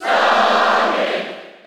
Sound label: Cheer German; Sonic; SSB4